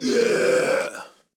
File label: Crowd Cheering; Pitch Black Audio Pong